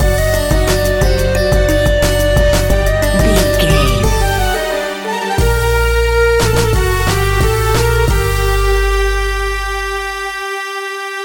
north indian ambient music; North Indian Ambient Music. An exotic and colorful piece of World music. Groovy, powerful, exotic and wild

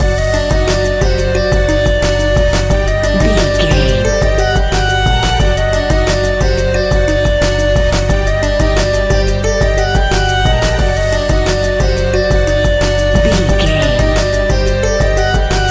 north indian ambient music; North Indian Ambient Music. An exotic and colorful piece of World music. Groovy, powerful, exotic and wild

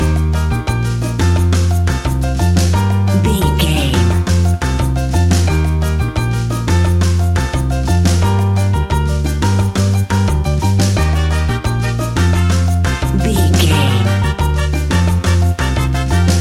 latin spanish salsa ballad; An exotic and colorful piece of Espanic and Latin music. Great for Zumba parti

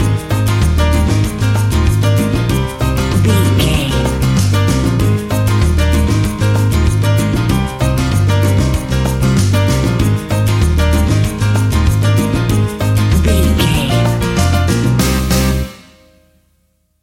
sexy spanish latin salsa music; An exotic and colorful piece of Espanic and Latin music. Great for Zumba p

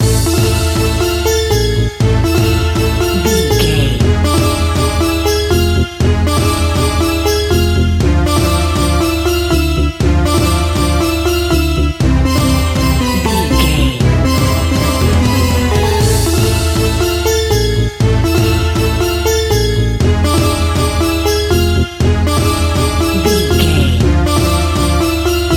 middle east ambience; An exotic and colorful piece of World music. Groovy, powerful, exotic and wild

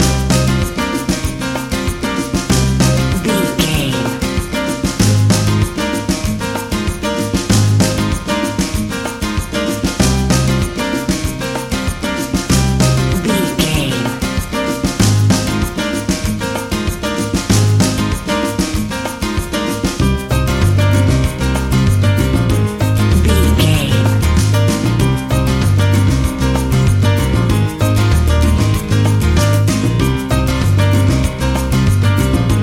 sexy spanish latin salsa music; An exotic and colorful piece of Espanic and Latin music. Great for Zumba p

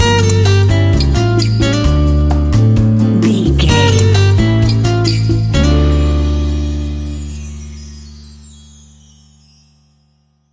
smooth latin jazz guitar stinger; An exotic and colorful piece of Espanic and Latin music

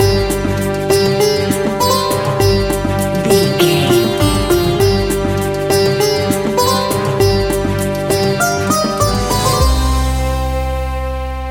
indian soundscape background music; An exotic and colorful piece of World music. Groovy, powerful, exotic

